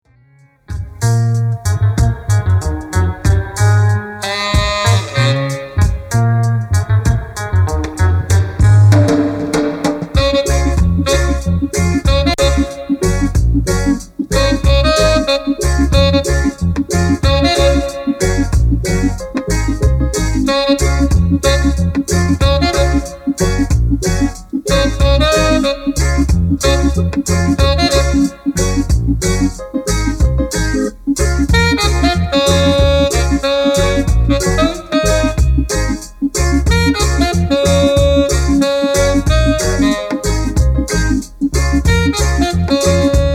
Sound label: With Horns